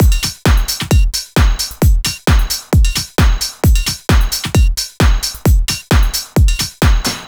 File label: Complete Loop, Dance, Beats